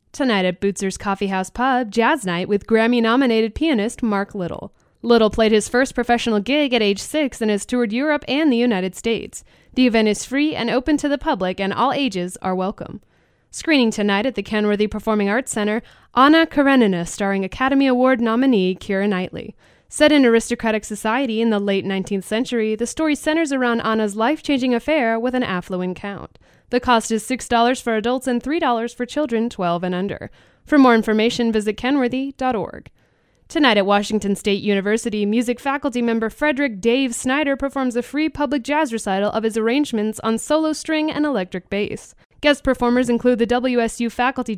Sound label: pianist performs; grammy nominated